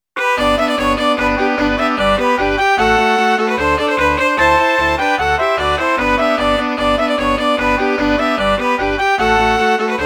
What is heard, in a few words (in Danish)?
en aegte tyroler hopsa MIDI